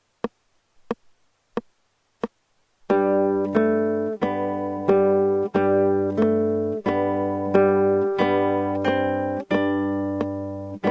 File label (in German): Solo in g, gitarre